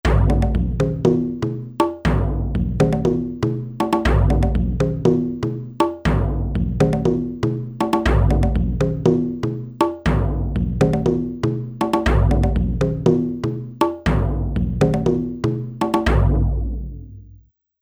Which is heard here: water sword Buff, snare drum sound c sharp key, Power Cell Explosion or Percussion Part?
Percussion Part